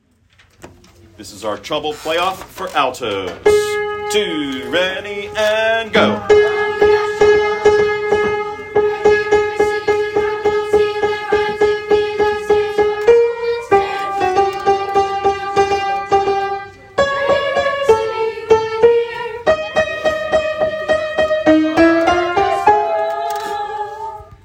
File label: Alto, vocal tracks; Rehearsal Tracks